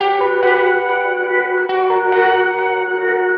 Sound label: guitar, Sad